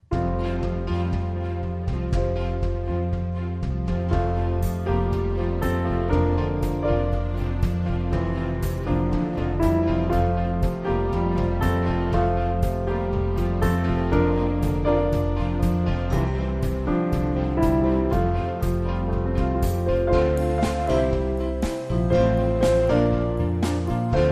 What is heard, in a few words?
With Trio